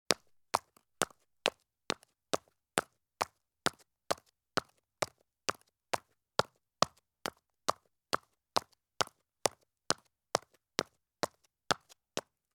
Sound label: Women's Medium High Heel Shoes Take Fast Steps on Concrete Stairs; Sound Ideas; Footstep Sound Effects